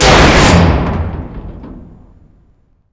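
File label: vehicle death wreck crush; WAR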